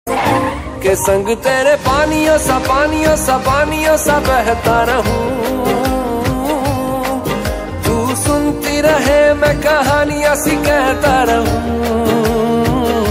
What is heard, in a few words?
Hindi Ringtone